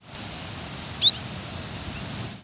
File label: Bobolink; Thrush-like calls; blackbir